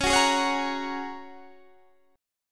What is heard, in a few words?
A sharp Minor 7th Sharp 5th C sharp